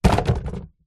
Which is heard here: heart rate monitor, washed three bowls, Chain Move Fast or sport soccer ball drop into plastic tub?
sport soccer ball drop into plastic tub